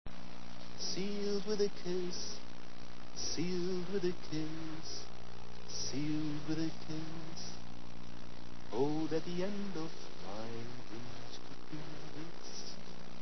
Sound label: chorus